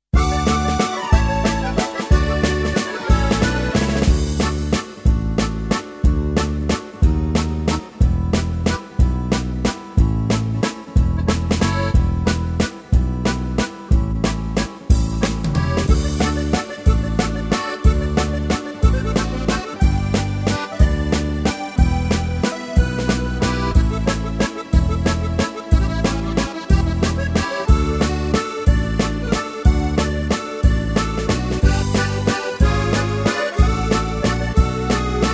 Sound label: Accordion Waltz, March Waltz; styles tyros2